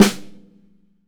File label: MAPLE SNARE